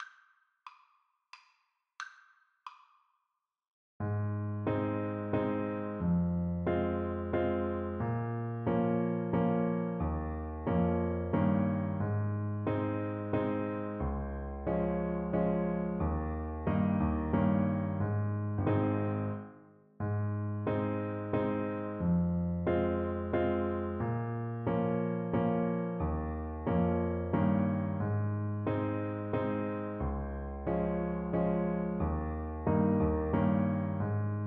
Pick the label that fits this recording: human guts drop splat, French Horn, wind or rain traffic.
French Horn